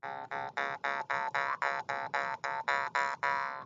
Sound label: mouth harp